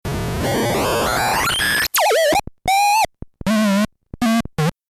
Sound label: bleep tone